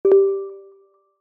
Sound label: warning; WAYMO